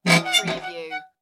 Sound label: Metal Squeak Scrape